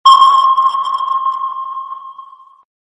Submarine Sms Sound

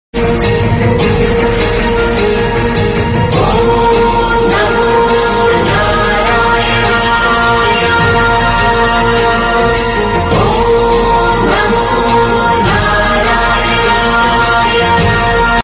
Perumal Manthiram, Tamil; Lord Perumal

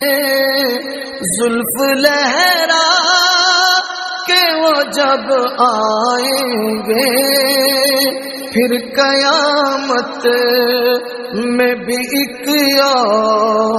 Hindi Song

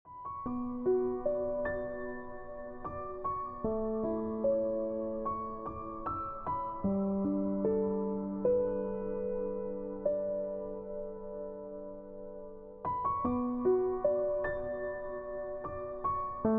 Piano Music

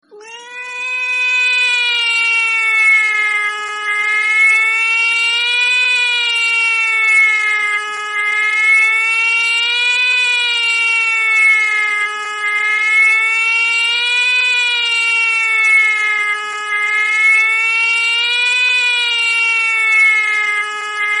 Cat Cute Voice Tone

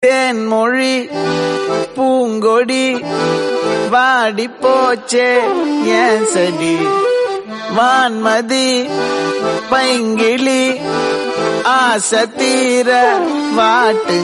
Tamil Song

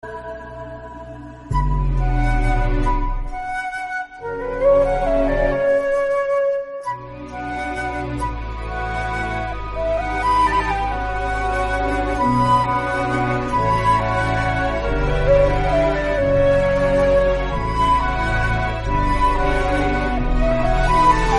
Bgm Tamil; Kaithi

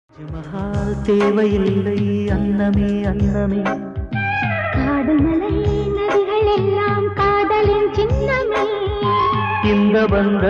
Tamil Ringtone; Amaravathi

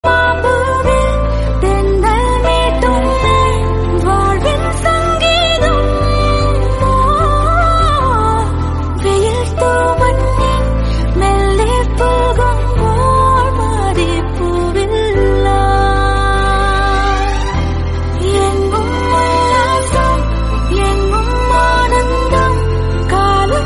Tamil Song

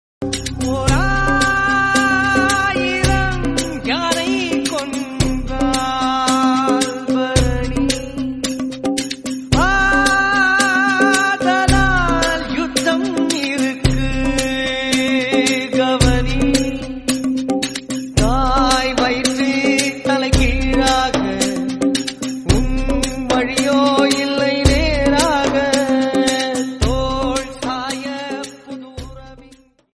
Tamil Song Ringtone

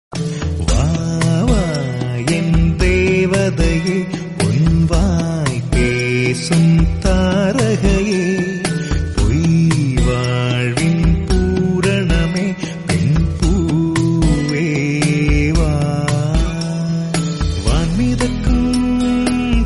Tamil Song

